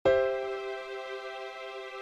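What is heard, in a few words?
C Major 2nd Inversions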